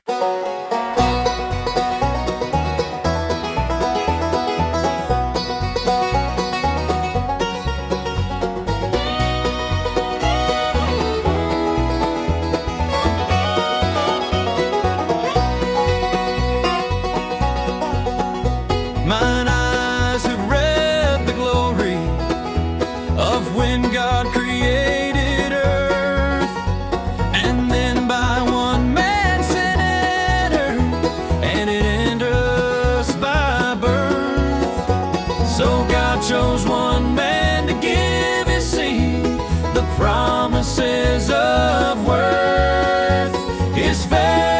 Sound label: Bluegrass, Piano, Banjo; Dispensational Hymn Of Faith